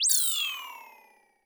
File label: percussion, Roland TD-20